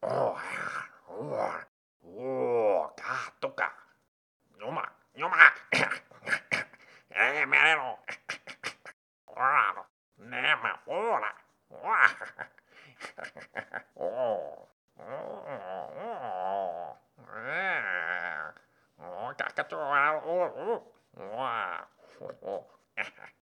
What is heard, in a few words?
idle rummage loop; Hollow Knight: Silksong; Thief